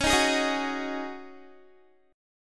C sharp Suspended 2nd Suspended 4th, C sharp, Guitar; C#sus2sus4; strummed; 20,18,20,20,18,x